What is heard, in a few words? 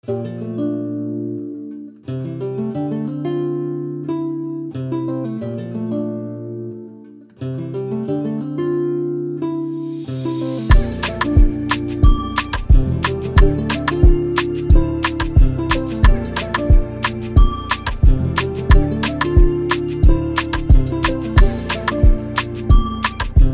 afro D min 90 BPM